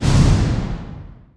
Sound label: centered strike